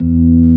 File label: ORG B3, organs; Sound & Vision; Gigapack I CD 2